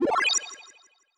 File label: heal short high